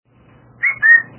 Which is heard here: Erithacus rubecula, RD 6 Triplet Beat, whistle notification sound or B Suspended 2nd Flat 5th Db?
whistle notification sound